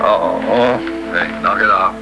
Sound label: Knock; charade